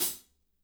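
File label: closed hi hat left, NATURAL KIT; Spectrasonics; Burning Grooves CD2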